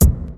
Kick

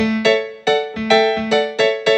Grand Piano Rock

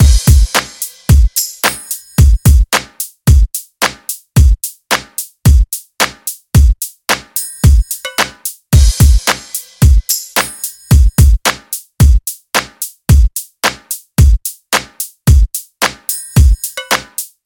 Hip Hop Drum Loop

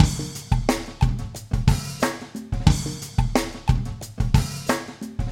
Hip Hop Beatz

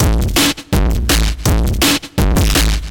Hardcore Garbage 165

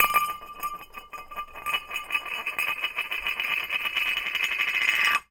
Hub Cap Falling Off

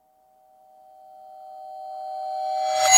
Movie Suspense

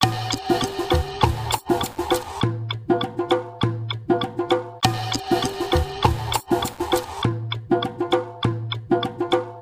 Peyote Drum Circle

